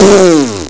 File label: bump; quake2